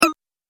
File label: Multimedia Button; Business Programs, Apps And Computing Noises